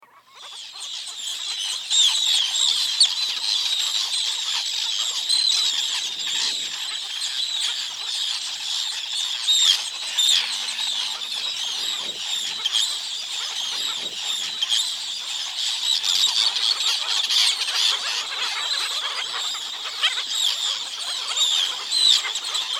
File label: Bird Call